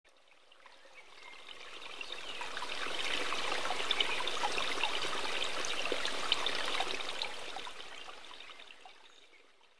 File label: relaxing with nature sounds water sounds bird song; study sleeping meditation